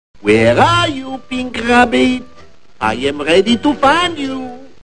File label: pew; LoonieToons